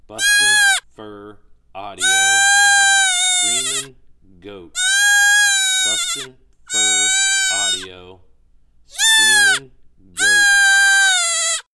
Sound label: Screamin Goat